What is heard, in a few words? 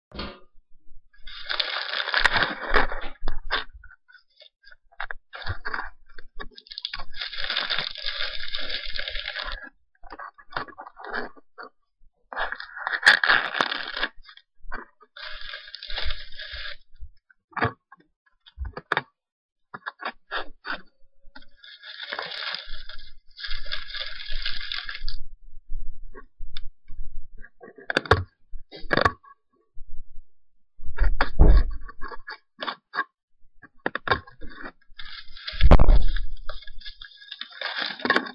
microphone rustle